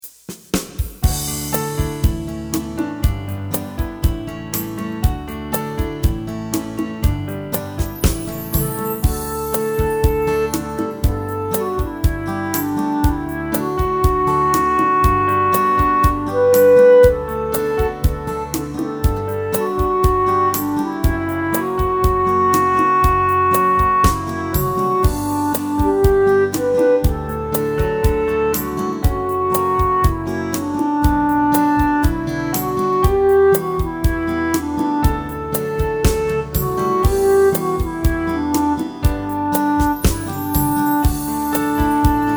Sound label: BACKING; backing w. melody